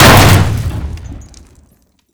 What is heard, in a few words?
explosion door metal breach; Explosions